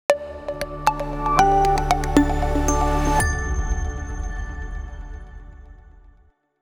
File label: Intro music corporate